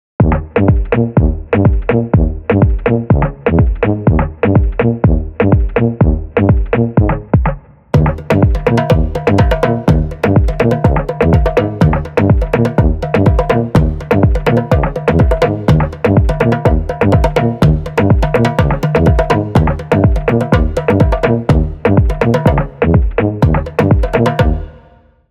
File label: Barrel Dance Underscore, Balkan Brass